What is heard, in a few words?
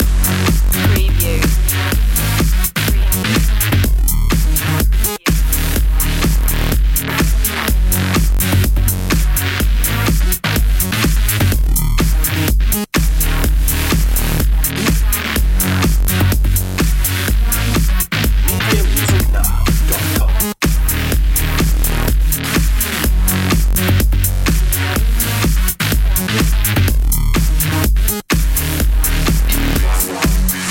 phunky beat loop